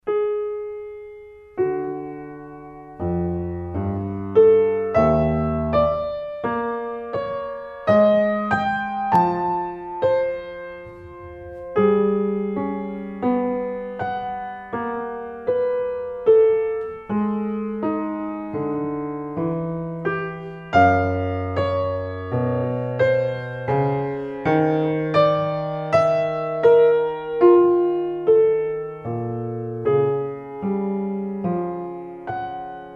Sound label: Meditation